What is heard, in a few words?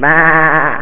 sheep; quakerepo fortress items